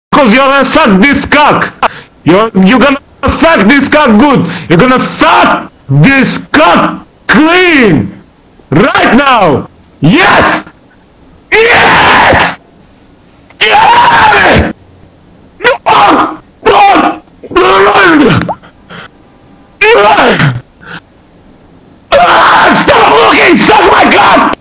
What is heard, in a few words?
breather; ambience; svencoop; cracklife